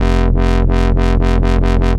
dubstep growl bass

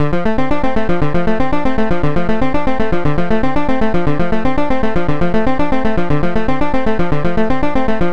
sparkly nu disco synth arp 118bpm Dm

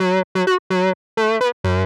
gritty electro synth lead f

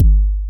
kick drum

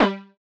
bright nu disco synth pluck in g

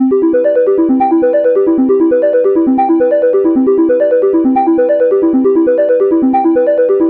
spacey arpeggio loop 135 bpm C major

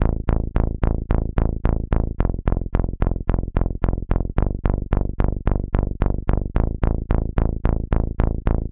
80s synthwave bass 110bpm 4 bars

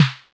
lo-fi snare

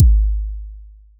808 kick

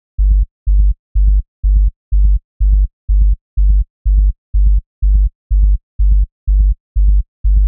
tech house sub bass roll 124bpm

